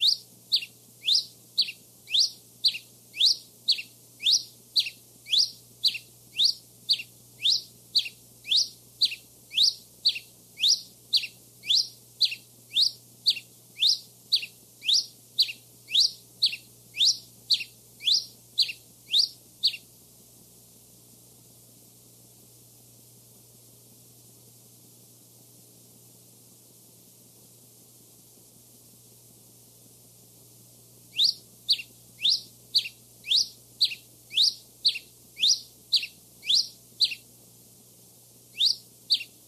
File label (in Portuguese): tico tico rei